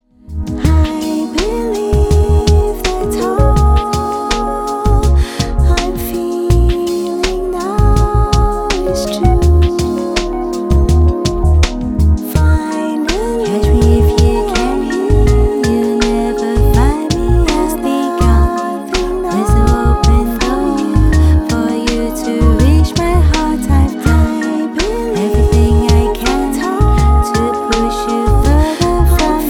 Lazy Hammock Chillout Mix

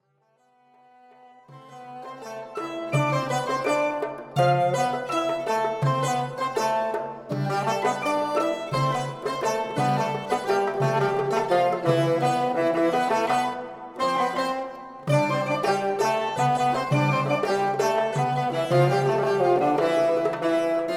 Estampie Oriental

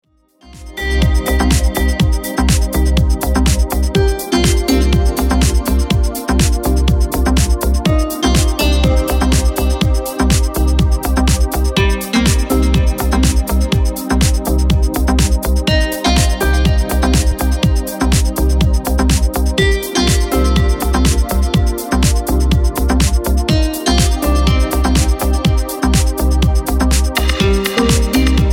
Violins Deep Guitar Mix

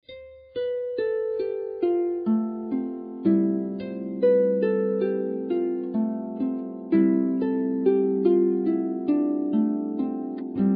Wedding Bells

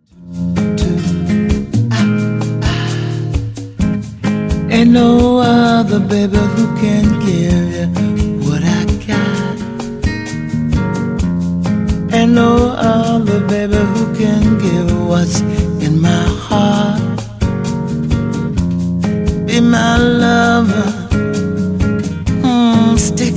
Acoustic Bossa Mix

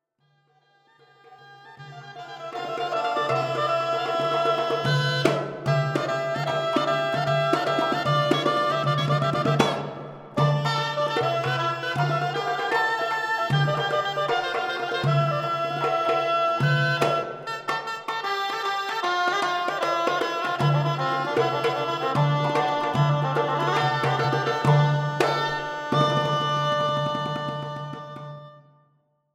Estampie

